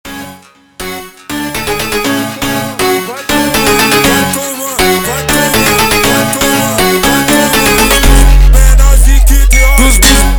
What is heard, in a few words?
Montagem slowed